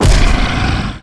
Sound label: Die